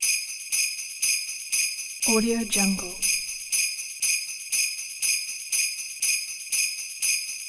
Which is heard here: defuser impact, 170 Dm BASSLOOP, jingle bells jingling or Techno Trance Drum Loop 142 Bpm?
jingle bells jingling